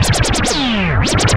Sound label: SCRUBBIN, Anime Sound FX; COMIC